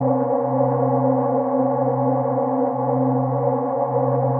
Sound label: drone; cstrike; niji